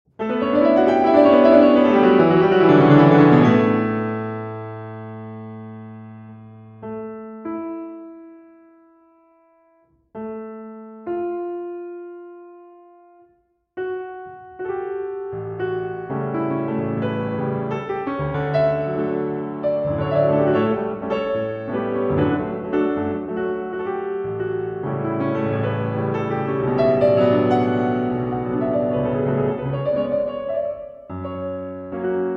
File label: Waltz Song, Solo Piano; La Bohème Musetta's; Leoncavallo